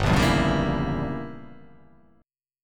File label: G Diminished 7th